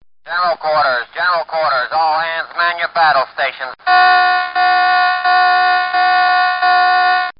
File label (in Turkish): Savas alarmi